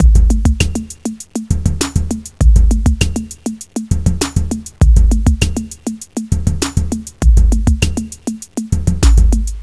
acid jazz, Drum loops, Electro

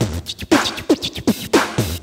square dance rap, Beatbox; Drum loops

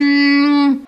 mmm

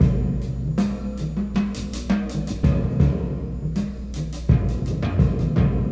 distant, Drum loops

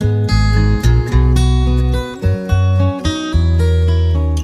guitar usefulness extended, Loops, Jazzmatazz

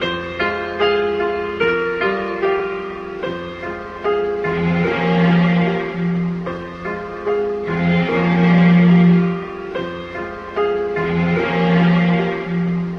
piano and strings, Sad Loops

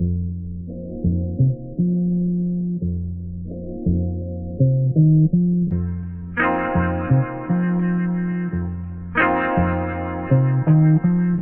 guitar wah wah, loops; Phonky

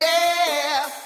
"yeah" sung

